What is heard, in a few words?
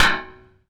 solid metal impact, Bullet Impact; Dystopia; physics